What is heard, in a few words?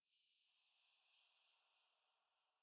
Under City Bat Chatter Distant; Ambience; Dungeons